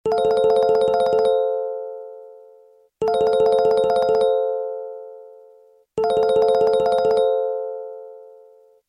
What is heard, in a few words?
BlackBerry Phone